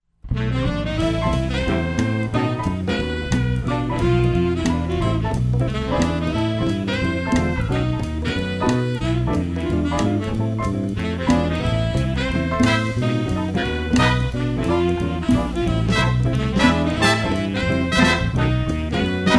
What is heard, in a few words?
sample swing swing swing, Swing Band